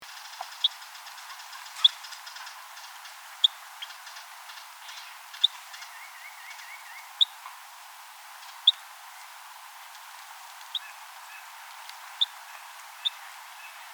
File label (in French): roselin pourpre, chant